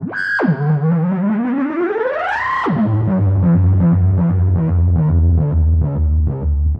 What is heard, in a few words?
Feed chorused, Feedback FX; 99Sounds; Dark Engine